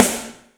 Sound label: snare, DnB Breakz Kit; Breaks N Beats Sound Kit